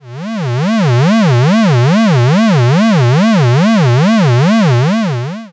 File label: Frequency Modulation